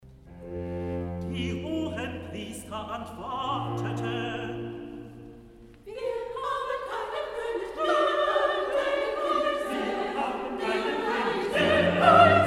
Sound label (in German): Recitativo Chor; Wir haben keinen Koenig